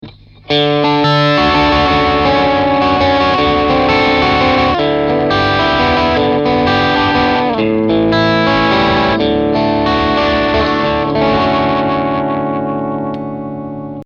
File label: Strat Am Pro Overdrive puente medio